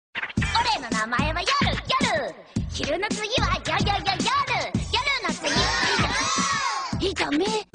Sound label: japanese yoru